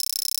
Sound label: loading